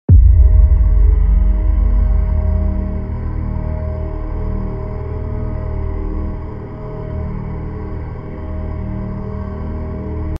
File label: D#min 90bps Action Combat After; Onyak; Bluefields; Astro Flame: Starfighter; PS4, PS5, Switch, Xbox One, Xbox Series X|S